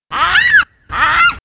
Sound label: scream